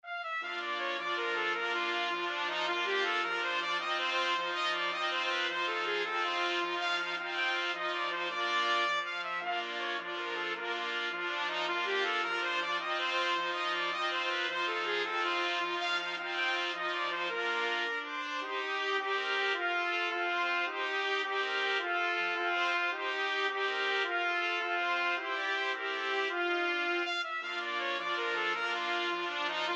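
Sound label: 4 trumpets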